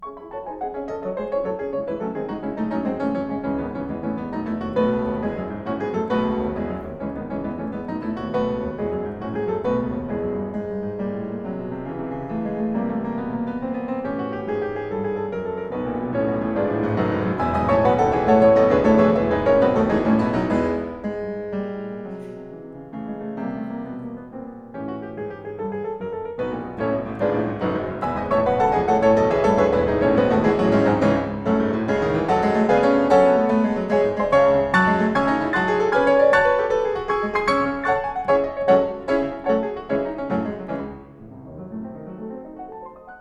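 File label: Symphonic Etude, piano; Shura Cherkassky; Schumann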